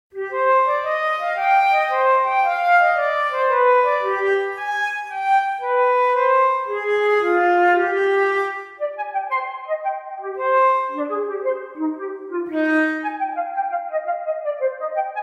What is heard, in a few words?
dance flute